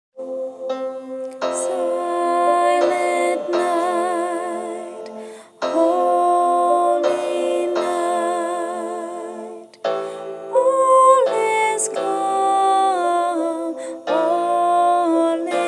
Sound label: silent night soprano